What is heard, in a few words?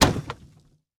spin Button Click; piratefromtheeast